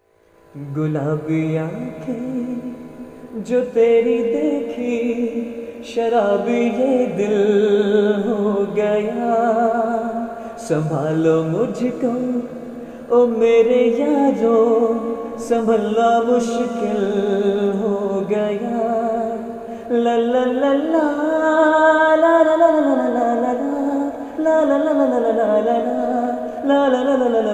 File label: Live Unplugged